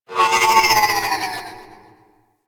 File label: Ship Mechanical Textures; Warframe; Corpus Ship Remaster